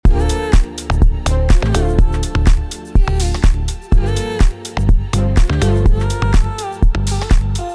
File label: house; unwritten; unwritten melodies; monosounds studio